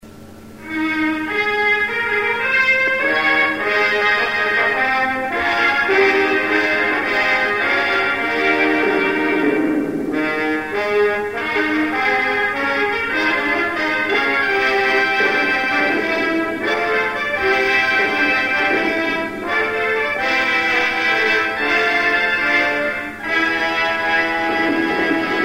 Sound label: fanfare; friedensfahrt; radveranstaltung; friedensfahrt und friedensreich